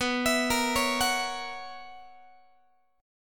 B Major 7th Suspended 2nd Suspended 4th, down, Guitar; arpeggiated; x,19,19,20,17,17; 4A,4E,5A,5D,5G#,6C#; JGuitar